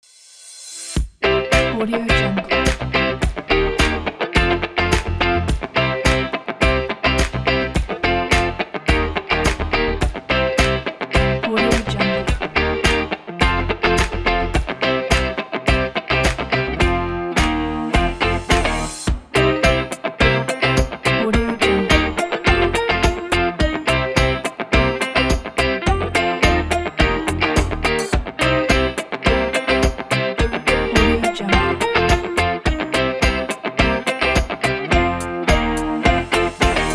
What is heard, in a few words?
carefree cheerful indie